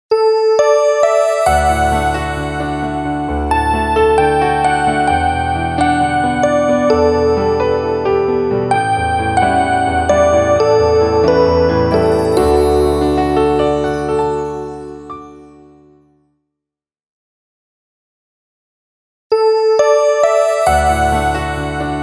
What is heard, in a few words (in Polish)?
Nagranie spokojnej muzyki z pauzami; Przedszkole nr 1 Tuchola